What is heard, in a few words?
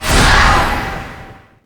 transformation